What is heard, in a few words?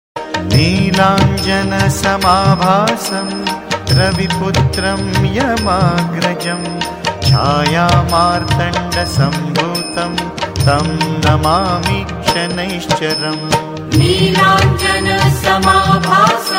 shani mantra